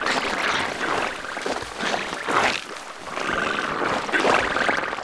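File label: swarm; permanentaliens; giants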